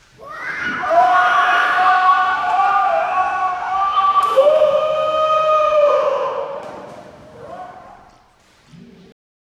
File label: indoor swimming pool panic